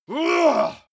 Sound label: male groan strain; menschen; schreie